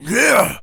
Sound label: Jump, Zombies; zps; Carrier